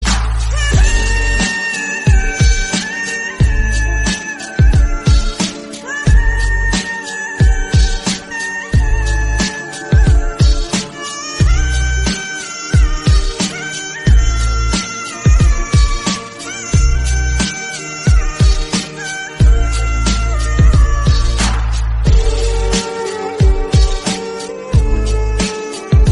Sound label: remix bgm